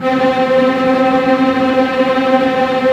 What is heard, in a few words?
TREM C4